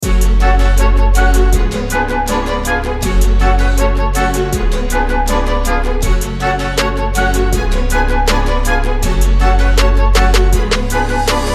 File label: background hip hop music for video vlog; white records